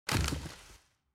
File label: tree Small Impact; out of reach treasure royale 2020 gamerip pc